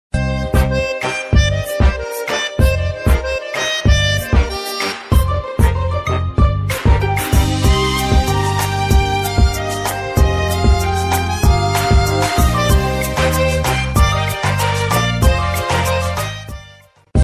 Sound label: Airtel 4g Ringtone